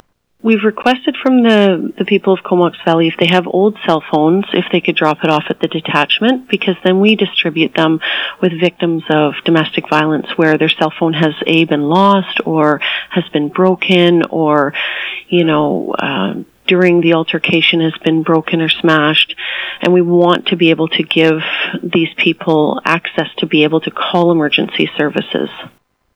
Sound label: cell phones